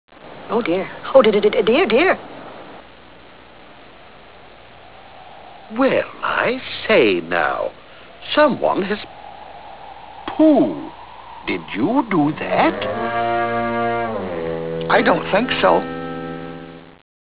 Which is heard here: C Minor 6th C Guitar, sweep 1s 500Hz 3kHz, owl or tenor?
owl